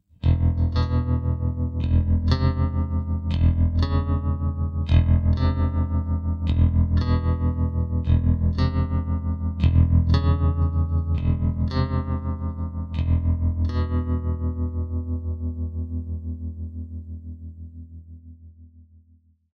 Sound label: Tremolo sinus